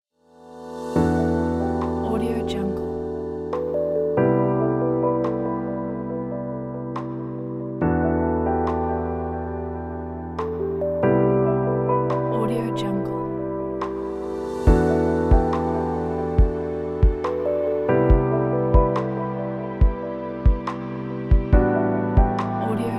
ambient piano technology

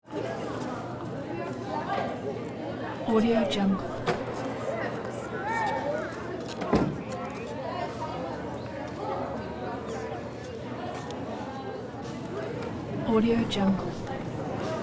school courtyard junior high student walla

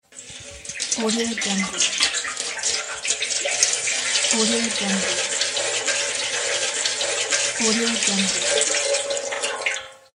gasoline pour

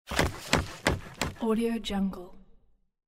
start construction

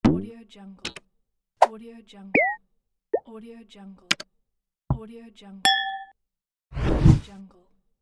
menu and interface sounds

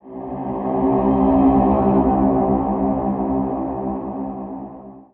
drone transition

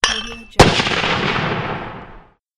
cannon load shoot

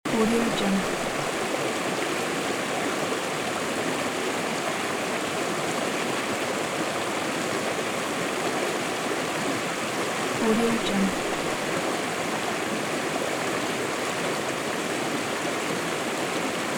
river with rapids

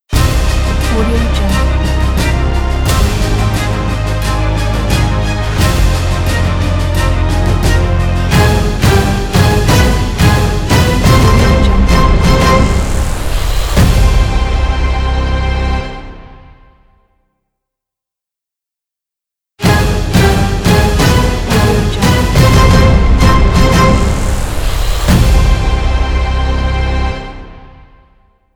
epic cinematic trailer intro ident

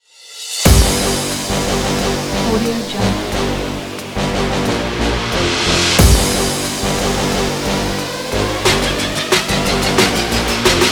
energetic hip hop; necreon9

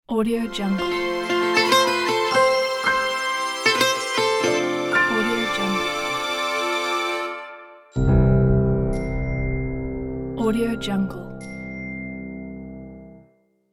italian restaurant logo

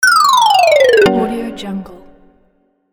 xylophone glissando wrong answer

